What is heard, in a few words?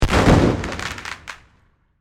Explosion Black Powder, Blast, Crackles; Warfare; Bomb Sound Effects; Blast Wave